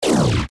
Futuristic Gun Sound; FXHome; Bullet and Gun

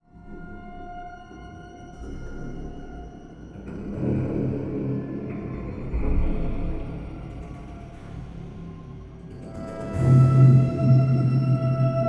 Dark Movies Ghostly Ambiences; Bluezone; Dark Movies Ghostly Ambiences and Sound Effects

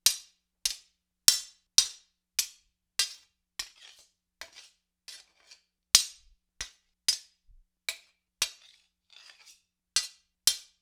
Seax Norse Sword Blade on Blade, Weapon on Weapon; StillNorthMedia

